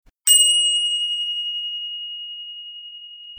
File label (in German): Fahrradklingel Messing